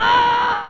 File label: AAAUUUGGHHH; gnat attack; super mario paint